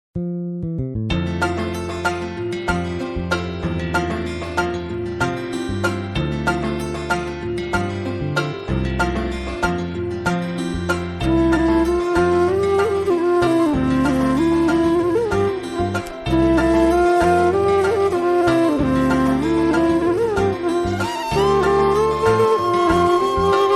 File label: Instumental, bhajan; Shyam Bihari